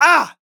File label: MALE VOICE DEFENSE SHOUT - Defensive Block or Damaged Hurt Submissive Short Interjection; Yell